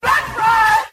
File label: "that's right" male SUNG